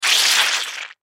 Squish Splat Large, Foley Squish Splat Large Juicy; Squishes; Objects; Blastwave